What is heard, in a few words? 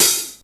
HAT MD, DRUMS; East West; Global Sounds